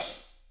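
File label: HH, hi-hat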